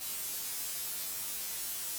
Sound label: allpass